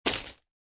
Poker Chip Piles Dropping On Playing Cards And Chips; Poker, gambling; Poker chip piles dropping on playing cards and other poker chips; Lynne Music